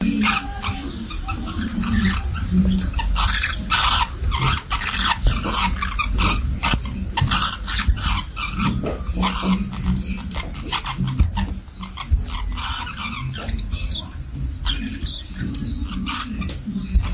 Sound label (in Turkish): forpus papagani ciftlesmesi